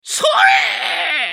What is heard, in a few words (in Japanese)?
攻撃長; ジャック